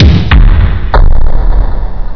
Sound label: bassdrum; Goon Squad